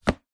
household book thick hard back cookery set down table